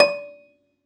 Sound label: Rubbery Piano, prepared piano; Total Piano